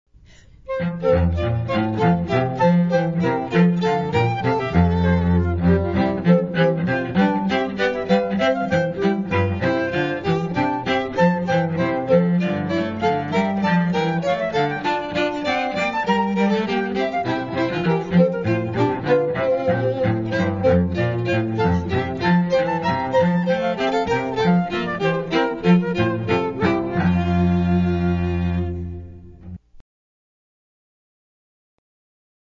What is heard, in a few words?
Bourree; Handel; Water Music